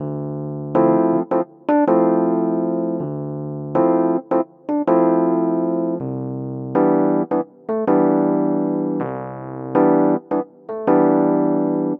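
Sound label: 080 Pop Mark II D major, E-Piano; Pop Rock; E-PianoClav; Pro Tools Essential Loops; Digidesign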